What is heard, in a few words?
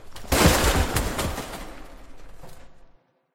push rubble; Poppy Playtime